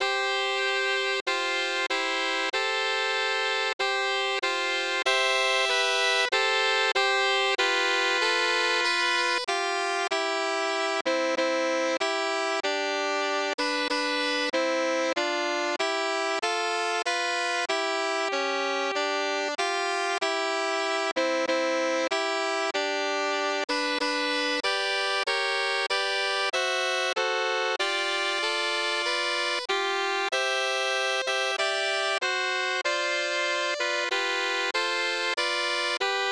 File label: America, the Beautiful - Sax Trio